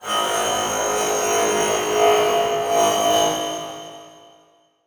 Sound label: Transition Metallic Drone; Production Element